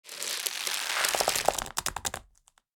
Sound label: Rope tighten and stretch short